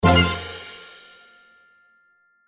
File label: prize reveal large; Fluffy Arcade: Doubleup Ducks LuckyTap; Eyecon